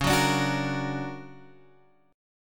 D Augmented Major 7th, chord

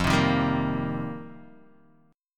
F 9th Suspended 4th, Guitar, DADGAD

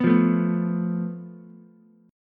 F Major Flat 5th, Ukulele; FMb5 strummed; JGuitar

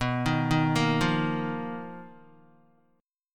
B Major 7th Flat 5th B down Guitar; arpeggiated; 4F,4A,5C,5G,5C,6E; JGuitar

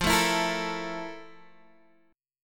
F Major 11th Guitar DADGAD

